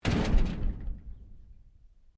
LOCKER METAL OLD DOOR SLAM; Old metal lockers; Sound Effects:Doors

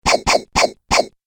Arcade Game Laser Blaster 4 Shots; Retro Inspired Sound Effects - Think Nintendo, Atari, C64, Etc.; Andrew Fleming

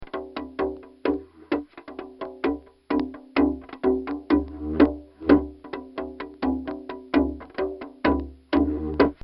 Dumbecki, drum; Drum Sound Effects; Musical; recorded in a custom built sound booth

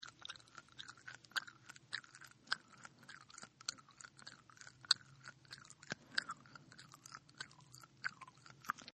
Chew Gum; Eating; Human

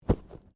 Bodymovement Fall Light

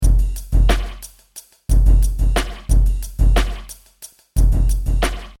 Hip Hop Drum Loop 90 Bpm Straight Without Vinyl; Next Door

